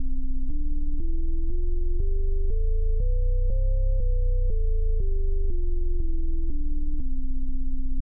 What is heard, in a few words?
c major scale bass wheel imperfection, hammond